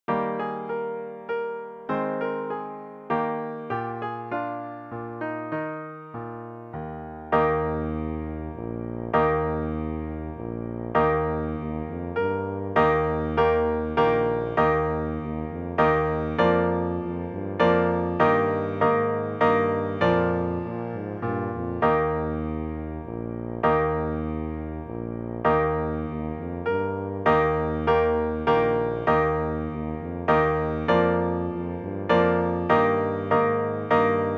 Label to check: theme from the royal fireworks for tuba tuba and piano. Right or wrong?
Right